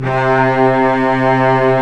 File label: Vcs2 f vb; STR Vcs 1-2 vb